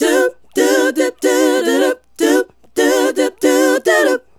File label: Female Group, Vocal